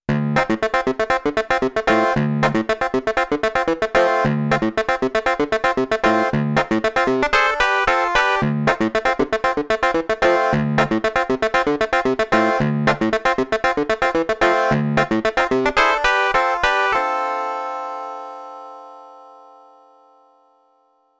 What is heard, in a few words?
Clav